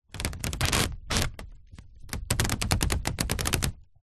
household plastic food wrap cling film stretched rubs squeaks